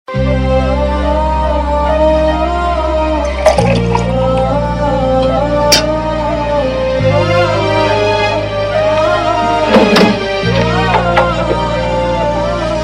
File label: Sad Tune; Iss Pyaar Ko Kya Naam Doon - Ek Baar Phir; Shlok